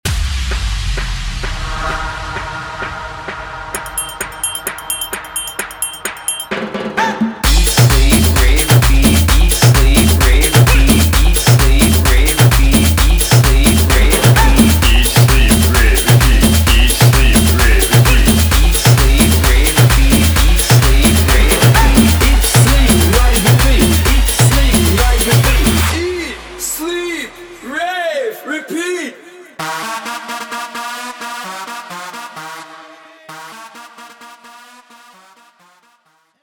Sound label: intro outro 130 bpm